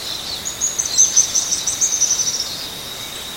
Cranioleuca pallida; PN Itatiaia

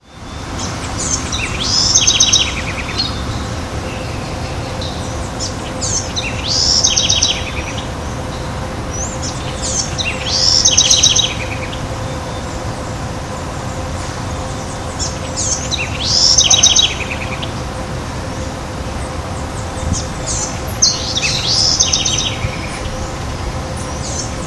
Troglodytes musculus; Int Butantan SP; Silveira

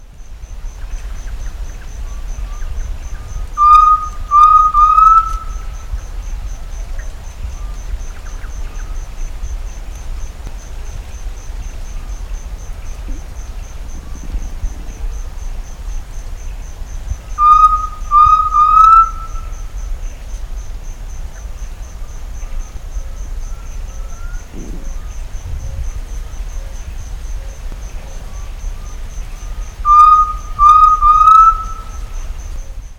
Crypturellus undulatus; Silveira

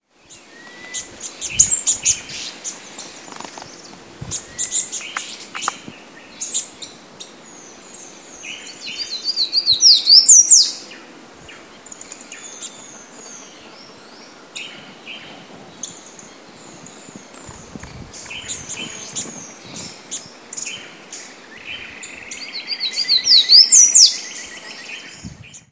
Basileuterus culicivorus; Ferradura; Canela

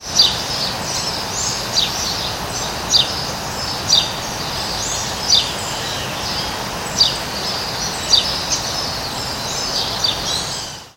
Passer domesticus; I Comandatuba BA; Silveira

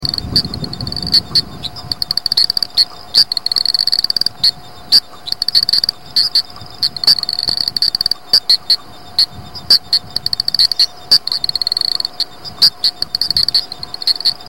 Hypsiboas polytaenius; Itapeva; MG

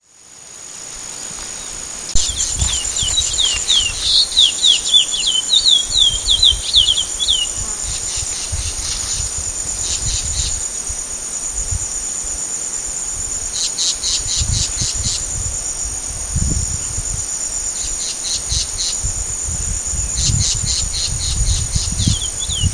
Sporophila angolensis; Sertao Mongagua SP

